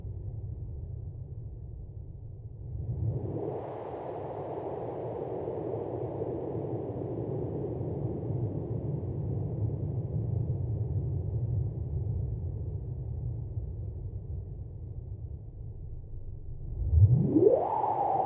serge vcfq noise sweep, synth

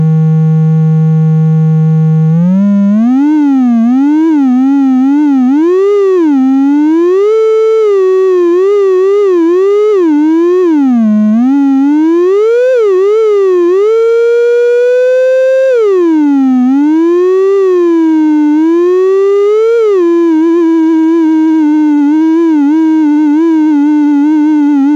osc mod slew, synth

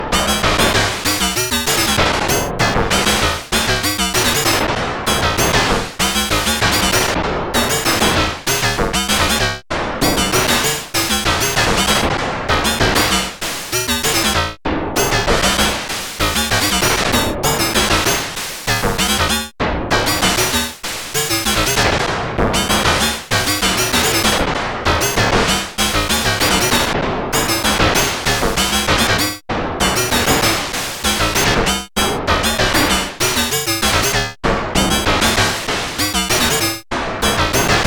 Varigate, synth; NoiseEng

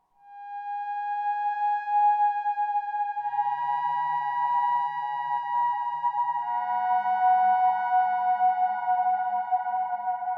channel manual delay

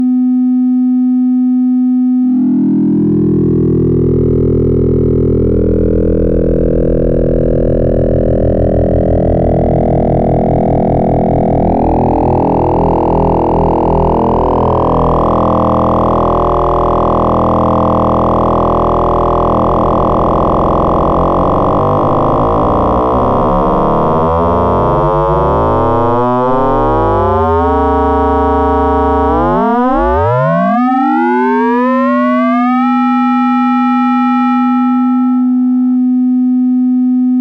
Thru Zero FM

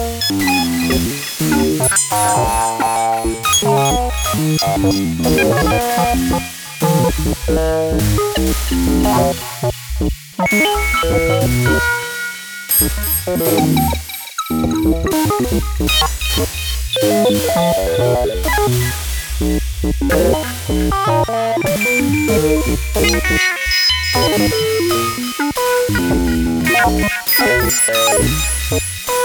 Odd Beats

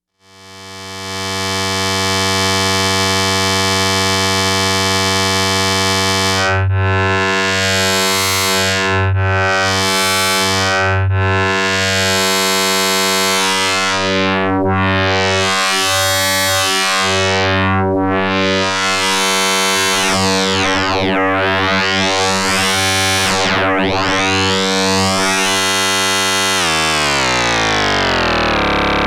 self mod manual, synth

